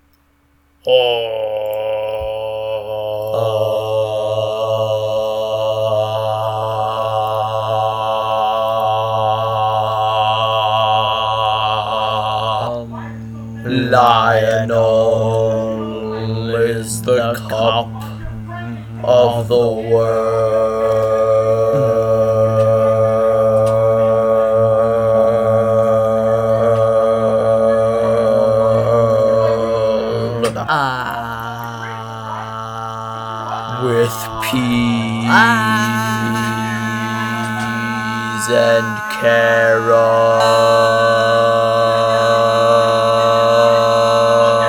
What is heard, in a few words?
chant